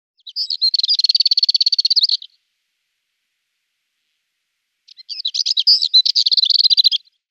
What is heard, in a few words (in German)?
Sardengrasmuecke Sylvia sarda; Vogelstimmen